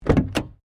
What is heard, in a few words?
WRECK CAR SMALL DOOR OPEN; Wrecked Cars, impacts, crashes, scrapes; Objects; Foundation4